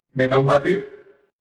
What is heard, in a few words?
ui alert